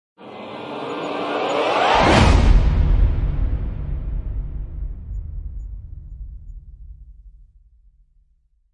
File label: Short Vocal Riser; Gothic Storm Music